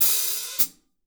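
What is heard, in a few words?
hat open close, drum; 90s sample CD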